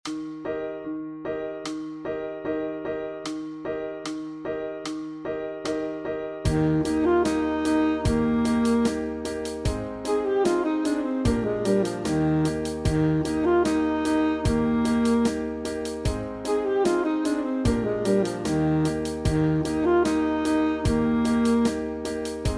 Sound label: Sax Study